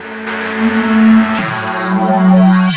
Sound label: Hutter Melody, Drum n Bass, Synth; KJ Sawka; Drum n Bass & Beyond